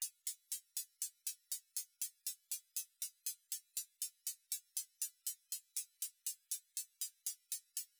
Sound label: hithat crash cymbal